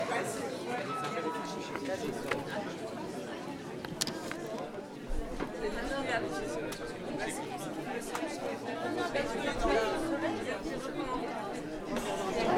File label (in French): Ambiance sous if; Barro; ZOOM; l'if et le tilleul pluricentenaire de Barro; Charente; France